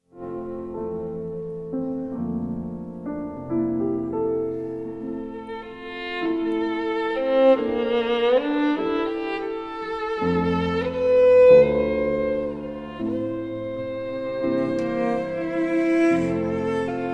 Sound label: piano trio in d minor; gabriel faure; op 120; played by the capucon piano trio